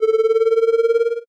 purupuru

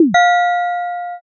shishiodoshi

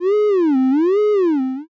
mojimoji

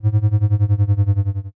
wanawana

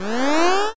gyuugyuu

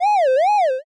mojimoji

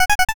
punpun

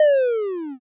shogeru

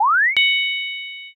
kiraan

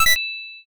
pikoon

